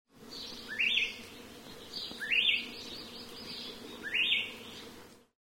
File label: oahu elepaio